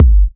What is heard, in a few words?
Trance Kicks; Bassdrums; Vengeance - Essential Clubsounds Vol.5